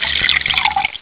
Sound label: fill